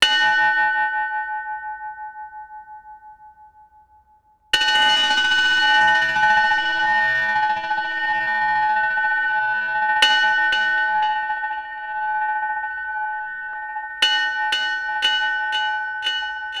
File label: Bells